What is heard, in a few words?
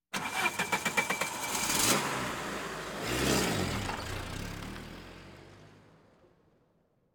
Automobiles various